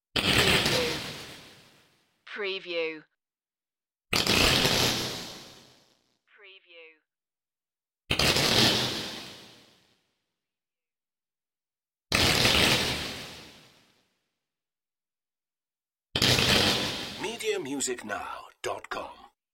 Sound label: space lightning sparkle